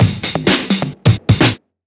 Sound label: Fills 128BPM, Clubsounds